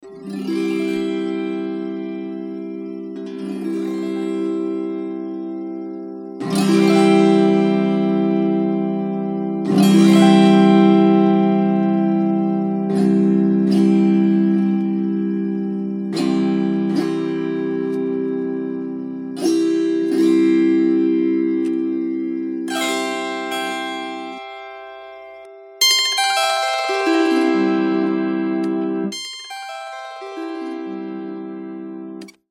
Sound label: autoharp chord articulations